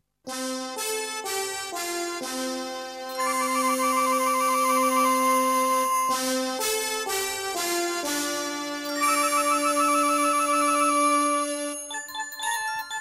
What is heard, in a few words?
JINGLE BELLS; 内地